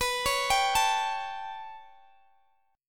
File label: B 7th Suspended 2nd Sharp 5th down Guitar Standard, chord; B7sus2#5 arpeggiated; JGuitar